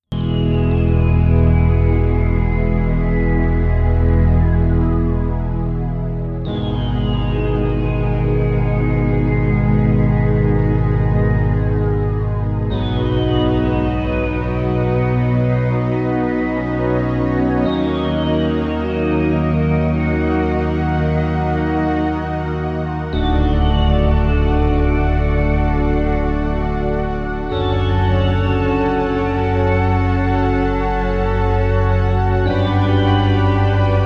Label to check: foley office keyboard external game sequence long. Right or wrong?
Wrong